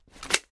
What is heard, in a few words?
Glock Mag IN